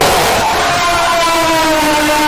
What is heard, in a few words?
funny jumpscare